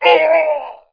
death; Daikatana; superfly

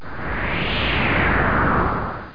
LIFT DO; Arcon

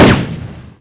shoot; PC Gamer IT CD 48; Big bang

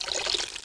WATER; Dosgames 2.0

